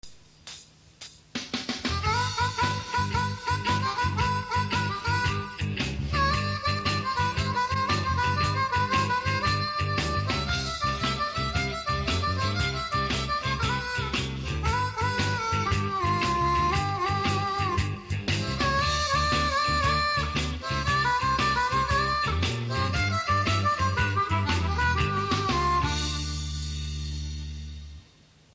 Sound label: pink blues, harmonica